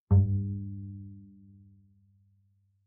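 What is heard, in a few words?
Bass pizzicato fortissimo sul A G2, Double Bass; MIS Pitches - 2014; Strings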